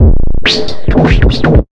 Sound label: 150, Big Beat Drumloops; USB Soundscan vol.25; AKAI